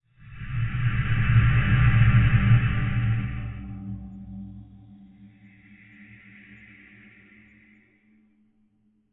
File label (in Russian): zvuki zerkala